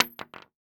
Weapon Bullet Shell 22 Dropping On Hollow Wood; AdobeCC

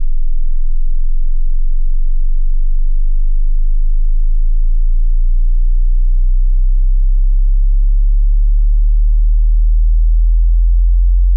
sine sweep log; Tones

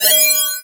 Beep High Pitch; Adobe; Multimedia Internet CD-Rom Flash

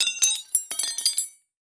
Industry Tools Drop On Floor

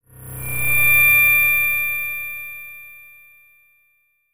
Transition Delay Medium; Production Element Title Transition

